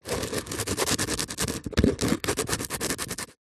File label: foley stone small scrape rub on grit dirt on plastic surface; zapsplat